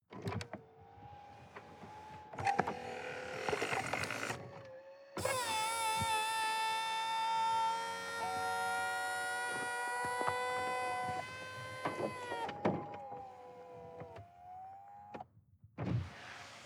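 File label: sports car exterior convertible top close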